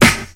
classic hip hop acoustic snare sample a sharp key

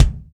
rich high end bass drum d sharp key; kick drum

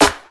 clear clap single hit c sharp key; single hits one shots

